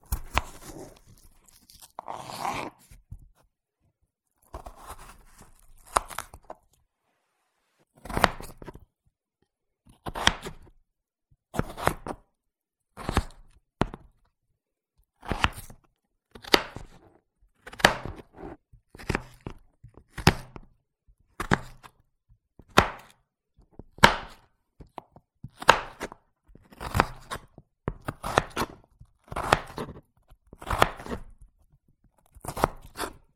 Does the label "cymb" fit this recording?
No